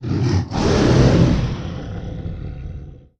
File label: Dragon Roars